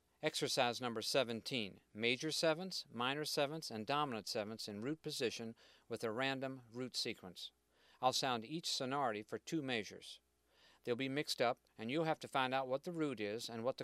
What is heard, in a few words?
Mixed Maj 7th Min 7th Dom 7th Random Root Movement 2 Bars Each